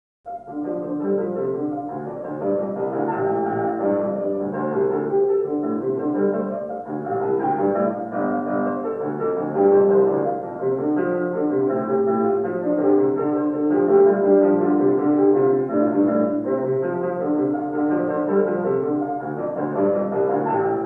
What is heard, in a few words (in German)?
klavierstucke, praludium